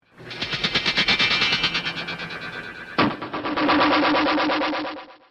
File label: bionic leap